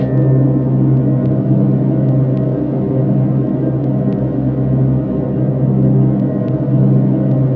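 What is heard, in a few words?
ATM HORROR, Production Effects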